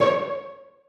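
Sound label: celli pizzicato c5; Sonatina Symphonic Orchestra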